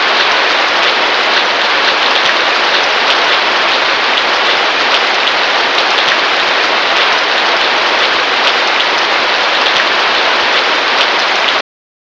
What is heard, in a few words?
rain